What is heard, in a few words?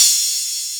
Atlantex Music Percussion Computer Cymbal Choke, drum machine